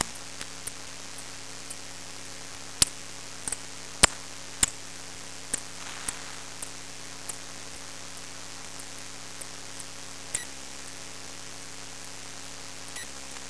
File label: Myotis emarginatus